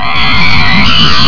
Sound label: attack; svencoop; necroscasm